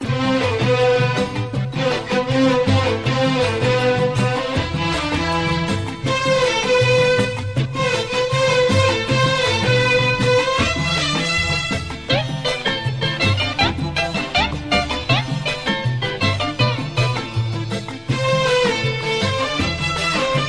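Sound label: Classical music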